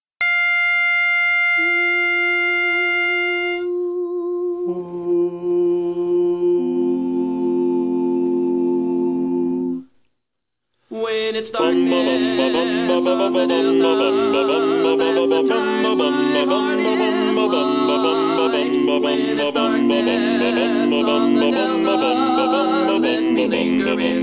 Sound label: Bass